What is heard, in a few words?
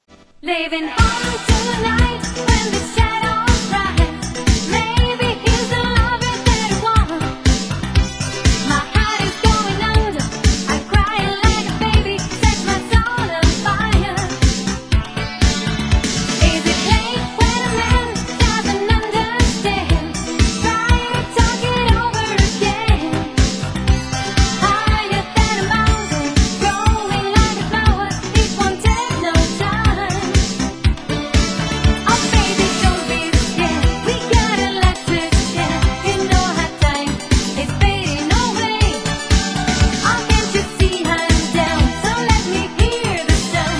Disco Version; ARGENTINA